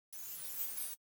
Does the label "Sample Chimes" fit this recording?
Yes